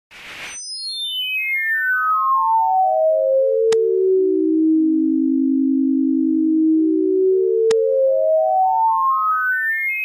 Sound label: sonification example parabola dipping below X-axis; SKDTools